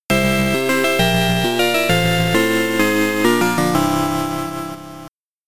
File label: Game Over, SCC; Knightmare; MSX